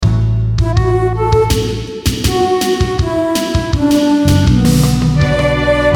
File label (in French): Timbales, Timbales dans l’arrangement; percussion